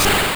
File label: Slam; Pokemon; Gen 1; attack moves; RBY